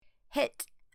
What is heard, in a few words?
hit